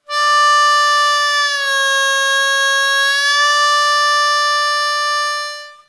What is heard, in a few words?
bend, harmonica